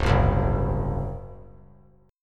Db Major 7th Suspended 4th Db down; strummed; JGuitar

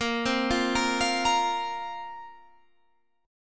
A sharp Suspended 2nd down Guitar Half Step Down

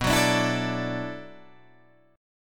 B Minor 11th B down Guitar DADGAD; strummed

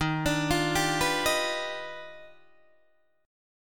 Eb Augmented Major 9th Eb down Guitar Open D

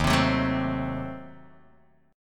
Eb Diminished 7th Eb, Guitar Drop D

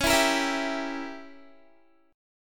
Db Diminished 7th Db down Guitar; strummed

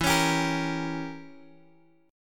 E Diminished 7th E, Guitar DADGAD

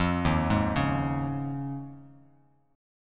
Eb 7th Suspended 2nd Suspended 4th, Bass Standard; arpeggiated; chord

